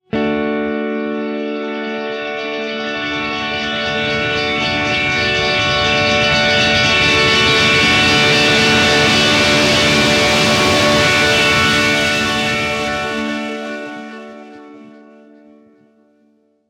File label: long revers, handsonic; teraecho